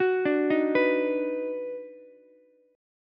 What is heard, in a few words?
Eb Minor Major 7th Sharp 5th, down, Ukulele; EbmM7#5; arpeggiated; JGuitar